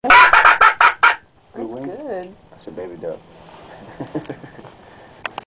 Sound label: quick Call